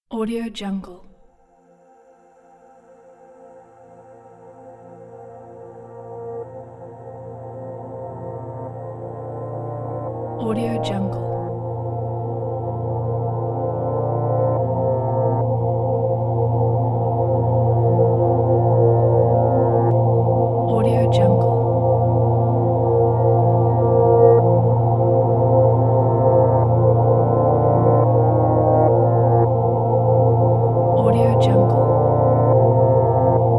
atmosphere background soundscape

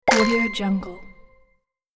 combo game hit advance

